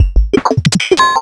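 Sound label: Roland MV8800 drum LOFISYN KIT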